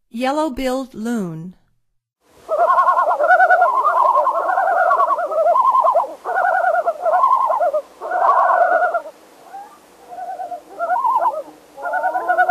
Yellow-billed Loon, Songs of Birds of North America; Stokes